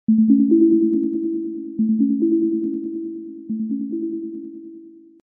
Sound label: loud alert